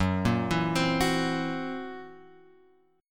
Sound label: F sharp 7th Flat 5th; F#7b5 Chord {2 1 2 X 1 2} arpeggiated